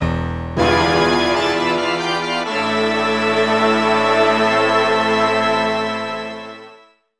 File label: Game Over (Jingle); Avalon Code; Nintendo DS